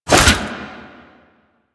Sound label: Rifle Swing; Lasrian Lancer Rifle; Lasrian Soldiers; Weapons